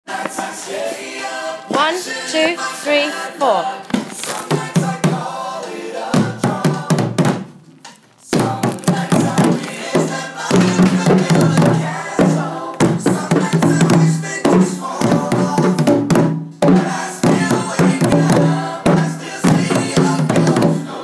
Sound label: rhythm